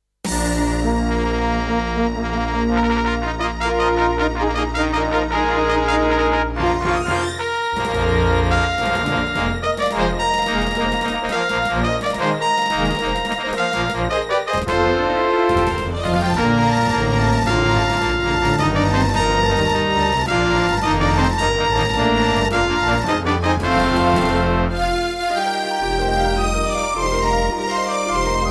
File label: Intro, Roland PMA-5; Dark Forces